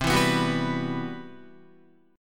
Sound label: C Major Sharp 11th, Guitar; strummed; JGuitar